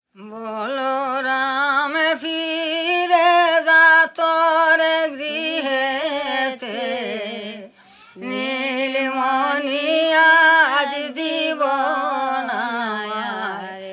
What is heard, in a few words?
Duet